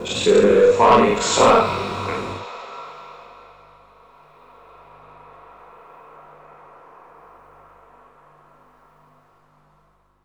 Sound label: Stereophonic Sound, Vocal FX; Zero-G Smoke